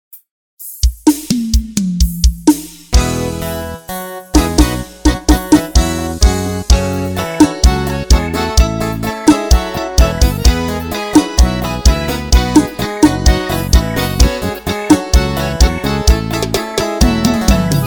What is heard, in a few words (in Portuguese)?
jbl playback